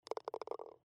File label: ball drop